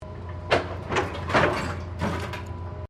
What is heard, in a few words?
Construction mechanical claw movement